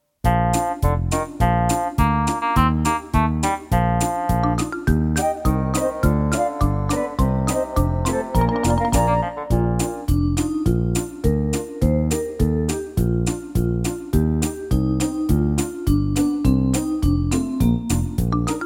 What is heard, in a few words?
karaoke only